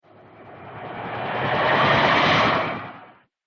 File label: Dance Riser